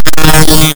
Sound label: Retro Electric Short